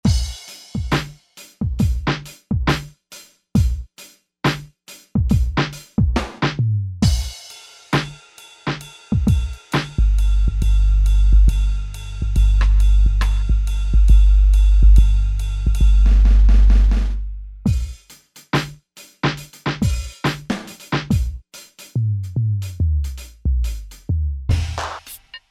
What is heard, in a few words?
Rust Kit